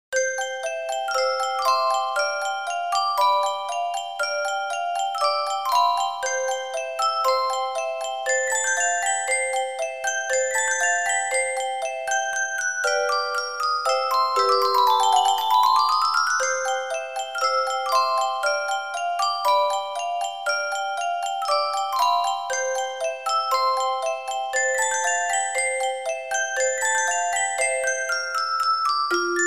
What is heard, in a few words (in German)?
rondo D-dur K485; オルゴール チェレスタ ミュージックボックス; ロンド ニ長調K.485